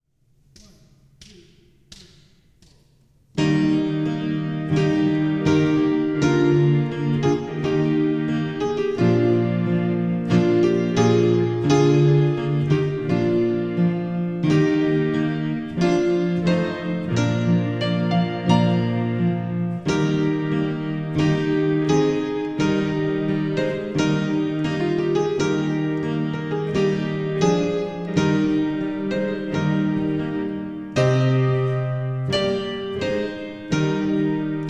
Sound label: Piano organ; My Faith Looks Up to Thee